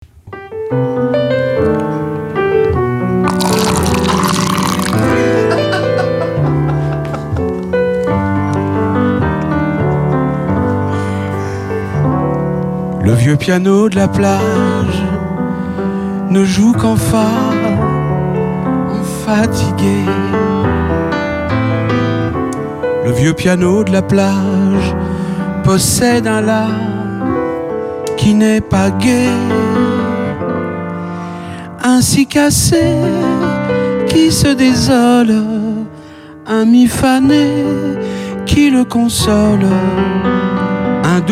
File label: piano plage